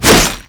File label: Whoosh, Weapons; Infested; Coda Caustacyst